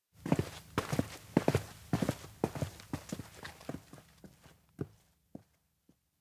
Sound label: steps outdoor town; ken; anemoi